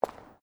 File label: FOOTSTEPS WOMENS LOW HEELS CONCRETE STEP; Footstep Foley; Sound Effects:Human